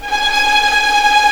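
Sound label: G#5 tremolo p violin left, violins tremolo; East West; The Ultimate String Collection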